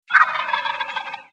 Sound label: Bird